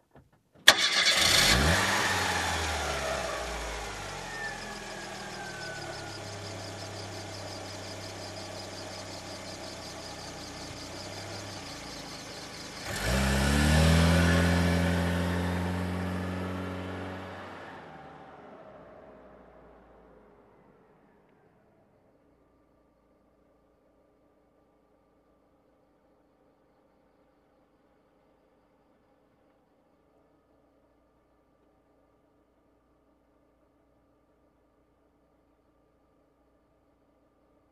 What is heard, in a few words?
Car engine ignition and drive away